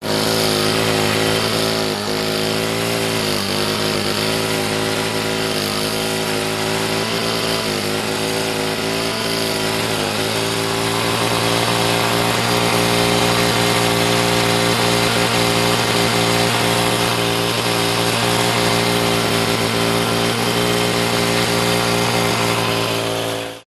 household whipper snipper petrol operate close

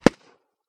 explosion very small pop; sound effects one

